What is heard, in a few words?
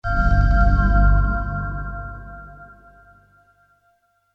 Bells